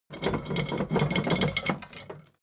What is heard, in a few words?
drawbridge winch hoist erratic; maschinen; metall; soundpool